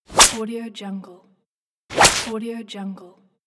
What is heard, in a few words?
a whip